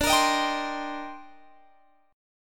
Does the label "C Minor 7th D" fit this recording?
Yes